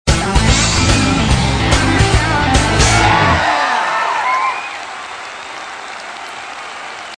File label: row end groove; camp rock